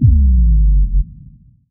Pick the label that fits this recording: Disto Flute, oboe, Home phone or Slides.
Slides